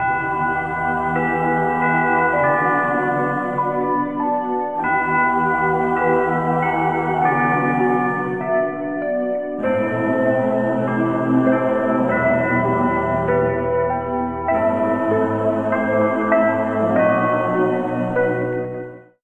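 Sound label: keys church bells ring, sad loops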